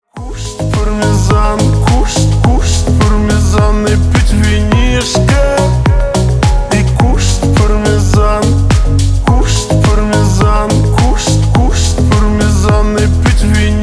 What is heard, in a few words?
slowed reverb